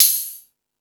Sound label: Rap Session, Drum Machines E-MU Pro-Cussion